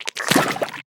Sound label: squirt